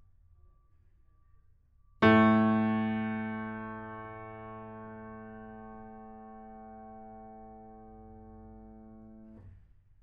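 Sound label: piano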